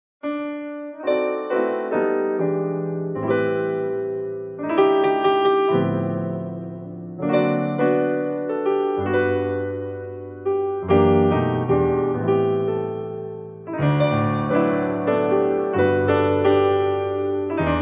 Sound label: Jazz Cover